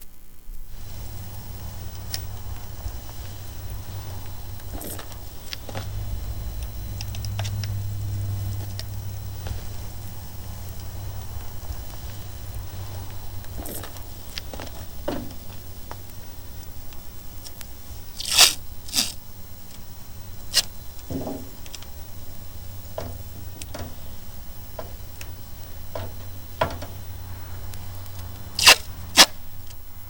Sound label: Guitar Drag; Neon Records